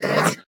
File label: Attack